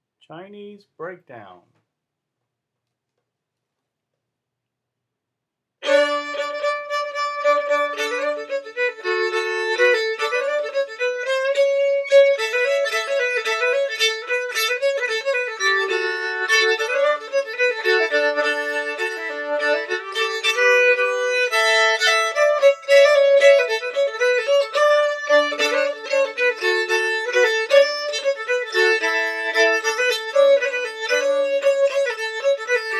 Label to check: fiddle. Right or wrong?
Right